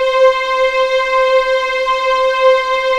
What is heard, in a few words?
C5 EXP MF, VIOLINS; East West; The Ultimate String Collection